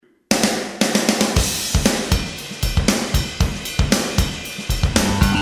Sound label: drums